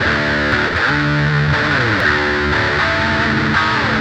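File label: Guitar (metal)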